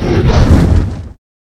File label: fry fly, trash collection